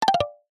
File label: multimedia ui tone short plucked nylon descend